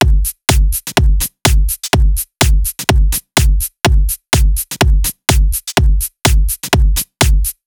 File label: Drums